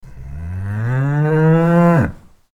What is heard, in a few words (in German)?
kuh